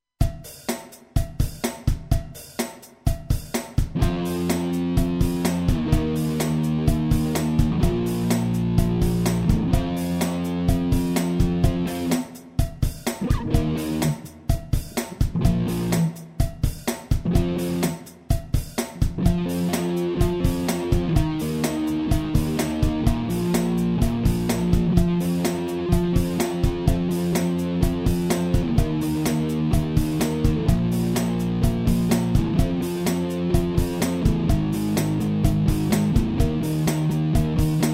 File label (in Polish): rozne rytmy w rocku